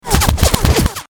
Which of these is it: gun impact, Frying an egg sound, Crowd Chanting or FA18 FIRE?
gun impact